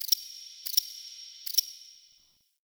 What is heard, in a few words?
Under City Tiny Creature